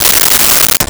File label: Silverware Pulled from Drawer